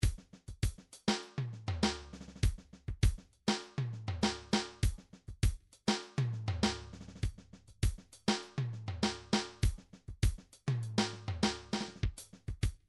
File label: rock, midi